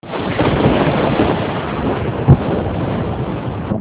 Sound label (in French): tonnerre